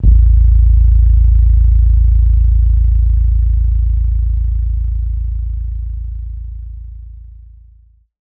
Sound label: A 27.5hz sub hit; daily samples